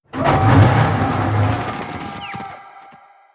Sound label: land alert; Hearts of Iron IV Death or Dishonor